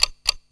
item timer; Spearhead Demo; MacFormat 2003 June

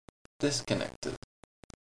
disconnected; Netbattleship

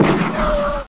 Missile; Raid

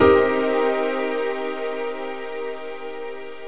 pia string, minor7; Terra Sound Library; construction set; piano; pad pianos

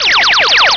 Win Game; Tic Tac Toe 3D

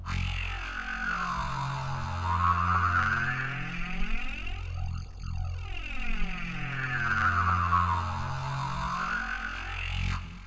whoosh

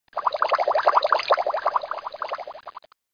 Bubbles; davidson

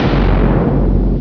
Mother Boom; Invaders! 1.2

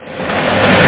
clang

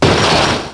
smash; JP Boxing

